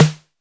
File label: Snare; Noise Engineering Basimilus Iteritas